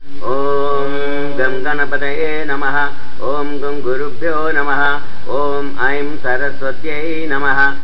om gam ganapataye nama, MALE SINGING

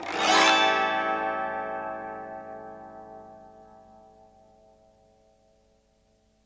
autoharp A7, Chords; Multisampled Autoharp

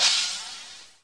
crap crash; drums; single hits

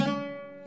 luth; GUITARS

